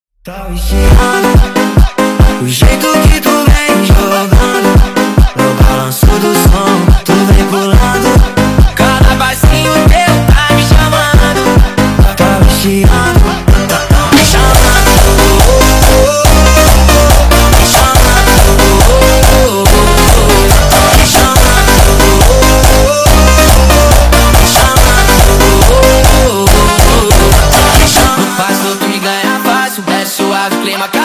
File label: MONTAGEM Sped Up